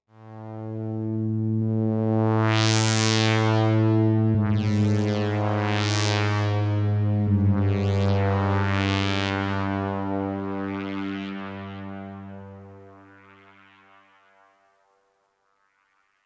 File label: Oneshot Synth